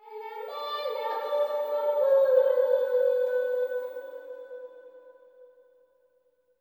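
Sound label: LITTLE GIRL, AFR. GIRL; Spectrasonics; Heart of Africa 2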